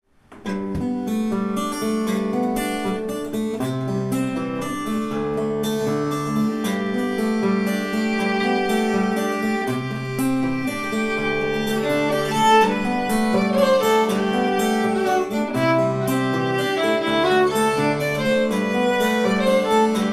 Waltz

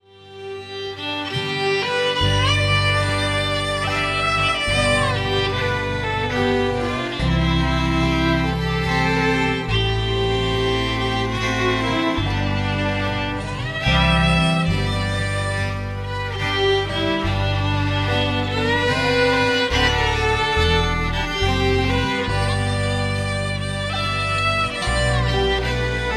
Three Step in G slow

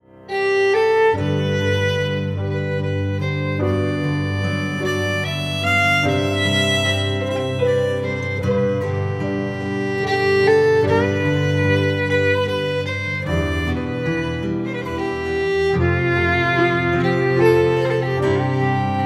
Waltz in G slow

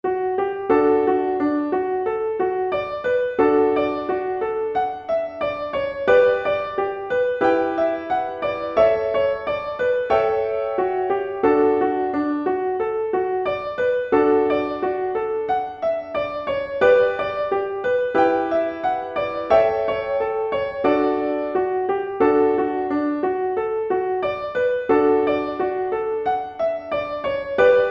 dundee hornpipe slower

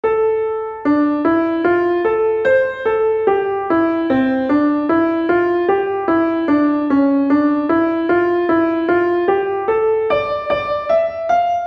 sergent early piano

